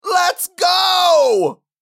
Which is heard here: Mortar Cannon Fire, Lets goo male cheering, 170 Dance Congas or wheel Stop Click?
Lets goo male cheering